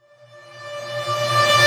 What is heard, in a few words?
Reverse Synths A#maj, Effects; Vengeance - Essential Clubsounds Vol.4